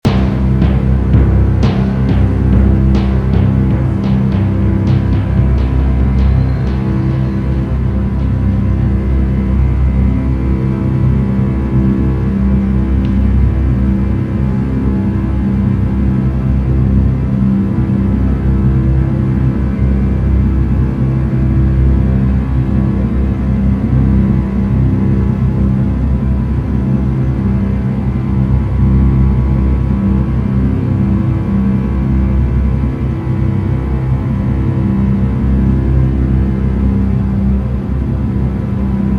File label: Tension Bed; BBC National Lottery